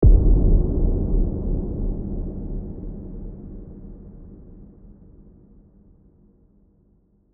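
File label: High cut reverb pre off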